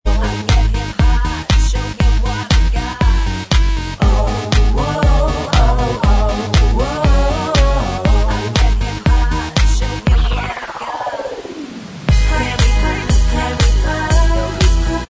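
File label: backing vocals Track, backing tracks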